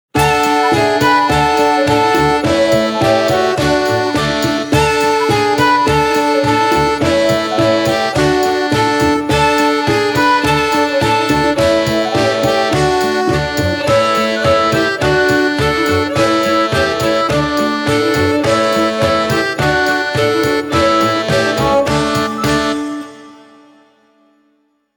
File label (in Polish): wersja instrumentalna zwrotka; ZAPUSTY